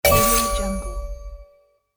magic achievement

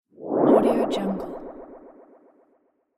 stinger

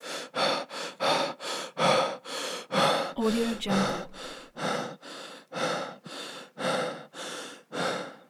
breath

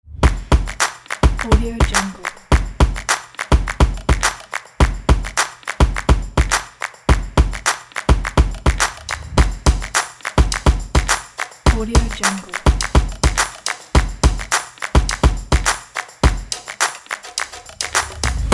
claps and stomps pack

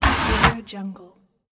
wooden door latch open

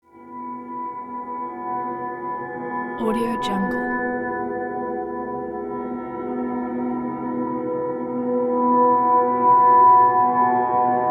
atmo pads resonating; smartsoundfx

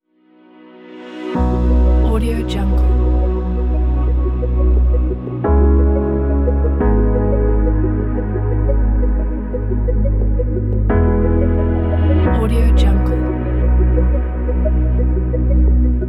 the documentary background

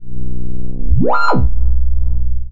hologram resonator swamp; soundmorph

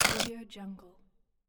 game crafting sound; stormwaveaudio

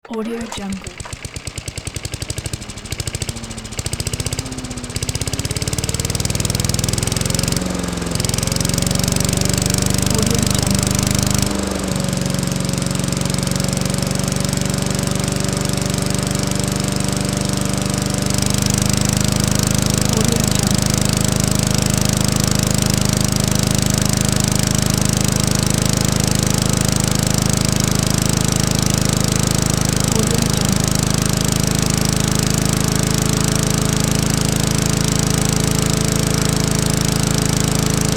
gas lawn mower; sound-ideas

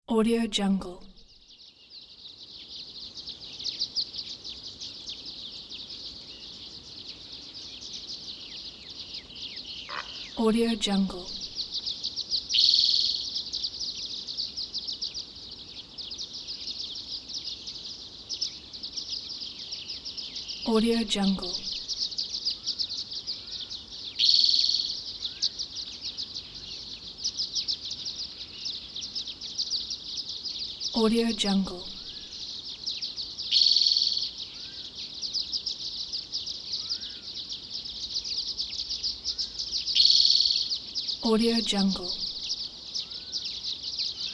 prairie ambience

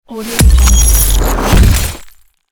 smashing logo

